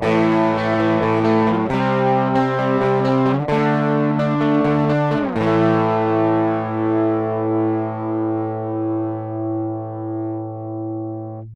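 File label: XFrame MidGain Power Chord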